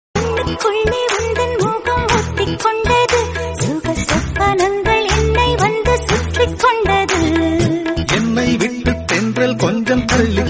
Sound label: song tamil; poove unakkaga movie